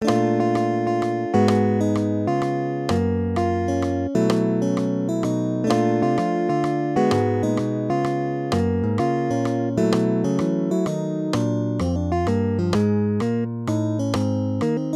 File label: duet blues, guitar; Triplet 8th; 3/4